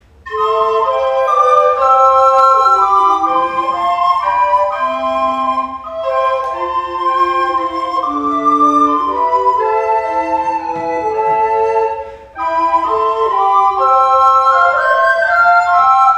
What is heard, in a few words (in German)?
Postludium Instrumentalkreis; Gottesdienste zum Nachhören; Osterfestgottesdienst